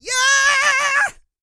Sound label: YELL, MALE HUMAN; Spectrasonics Vocal Planet